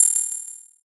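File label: bell; suzu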